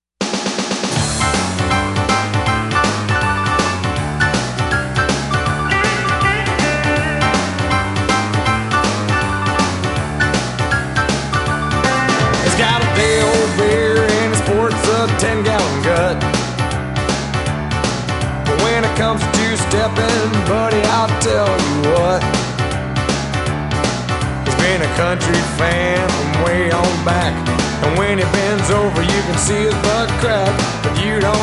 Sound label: country